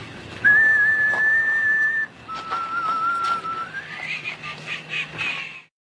Whistle Laugh; Negan

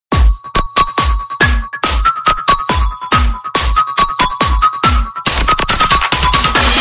3d Bass; Airtel

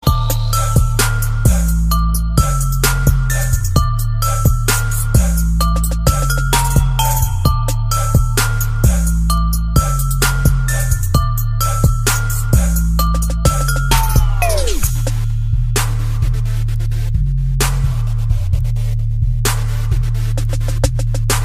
trap

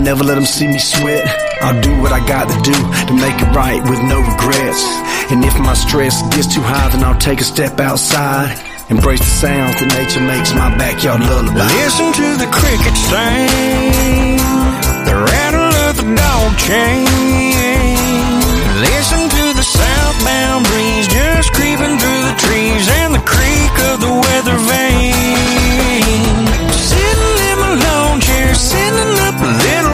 Backyard lulaby

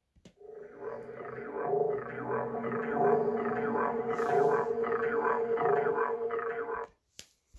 Monster Sound

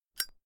Lighter close

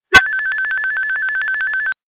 Ringer

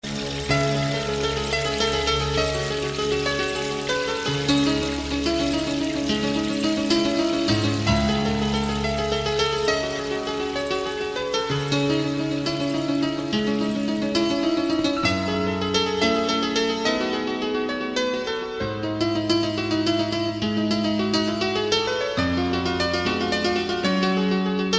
Iraqi Piano Player

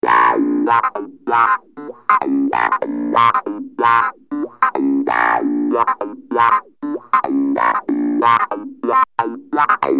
Funky

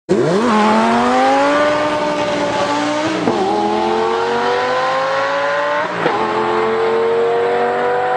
Lamborghini Gallardo

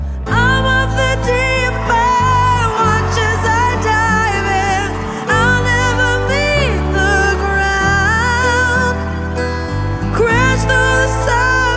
Chorus

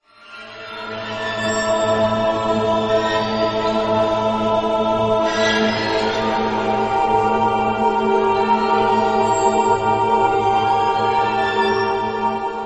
Space Solar Tones

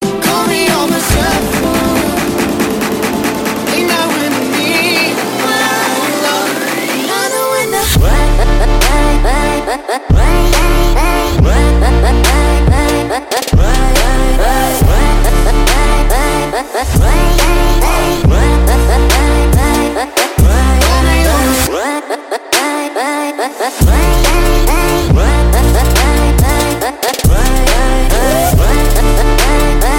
trap

